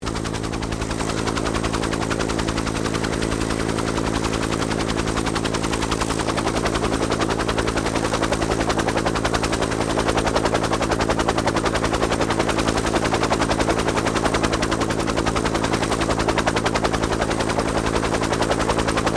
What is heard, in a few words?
HELICOPTER 1967 BELL UH1 IROQUIOS PREFLIGHT; RAN UH-1 Iroguios; Helicopters (Sound Effects:Vehicles)